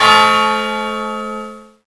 CURCH BEL

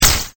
blow nose

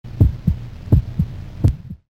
Heartbeats

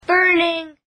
burning

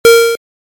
beep

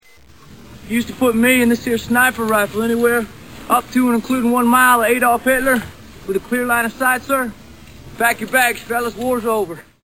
SNIPER

